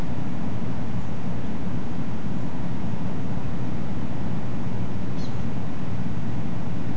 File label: unidentified waterbird, nocturnal flight call; nocturnal flight call project in beijing autumn 2021